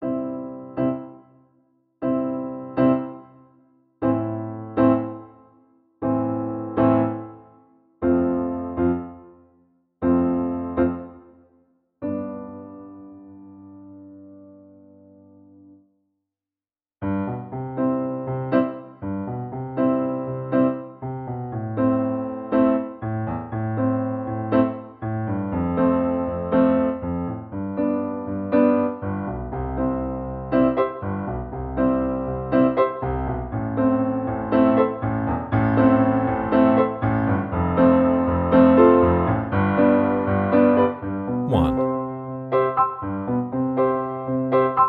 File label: I vi IV V Piano C